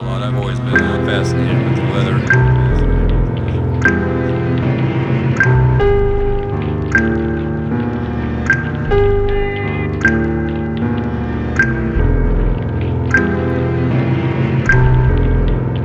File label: instrumental